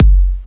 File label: hurd kick; Kicks; single hits